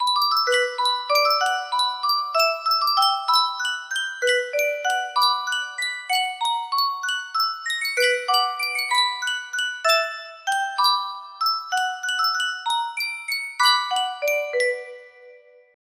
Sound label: Yunsheng music box, music box melody